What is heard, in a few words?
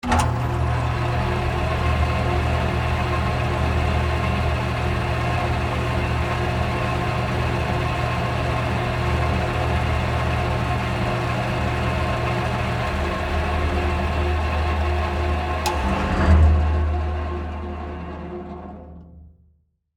Band Saw On Run Off, Industry; Motors; Machines